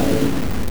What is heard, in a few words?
rrrip; ripping sounds; Rip #1